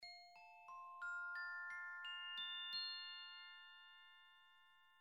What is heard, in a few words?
Glock